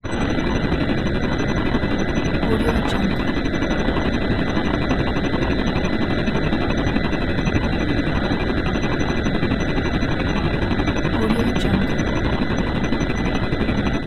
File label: helicopter interior